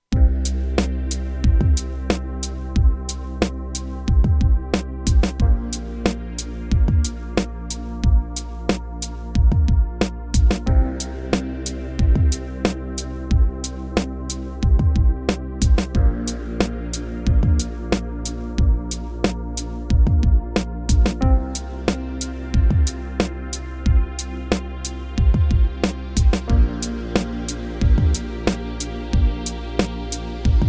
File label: Game Menu (Standard and Looping), A cool, hypnotic piece with a bit of mystery.